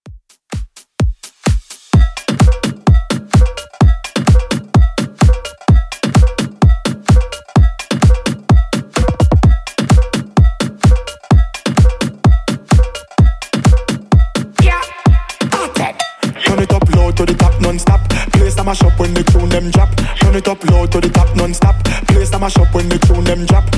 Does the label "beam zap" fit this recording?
No